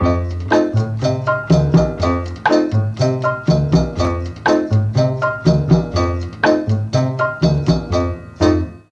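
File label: A1 CHA CHA FLUTE, mellotron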